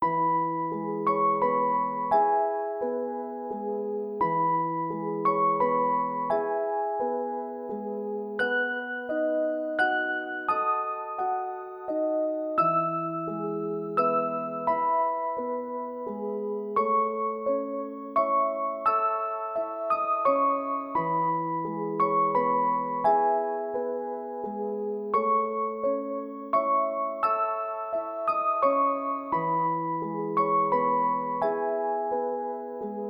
Baby Schlaflieder Kinderlieder, Nursery Rhyme Instrumentals, Silent Night; Sanfte Baby Schlaflieder